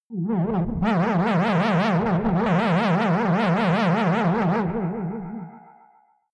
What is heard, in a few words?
defect ufo